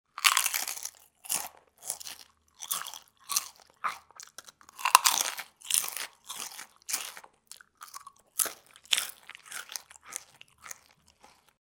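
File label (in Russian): хруст чипсов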